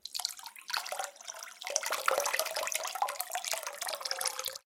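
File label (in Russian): вода поток спокойный нежный близкий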